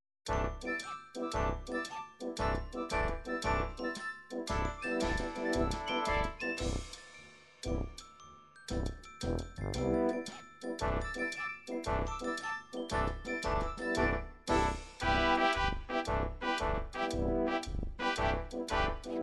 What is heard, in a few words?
6 8 march